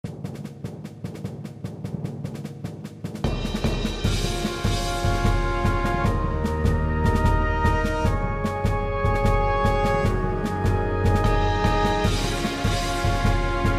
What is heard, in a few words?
Tribal Trial; A Princess Tale